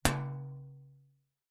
impacts metal small road sign knock hit with small metal object resonate